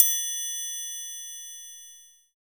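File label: HARD TRIANGLE OPEN; TRIANGLES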